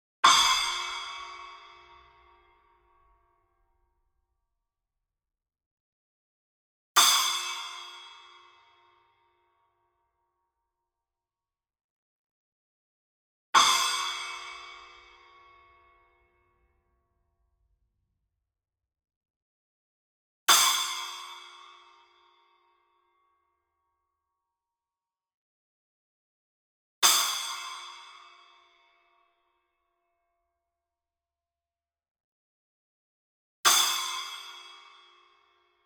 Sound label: CYMBAL Splash Hit Medium; boom library; Cinematic Strikes Construction Kit